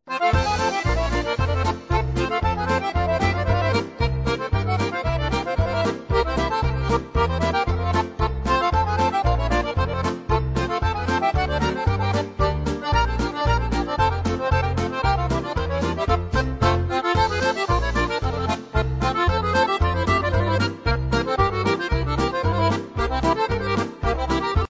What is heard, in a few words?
SWISS POLKA